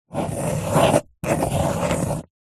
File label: office pen ballpoint paper hollow table draw diagram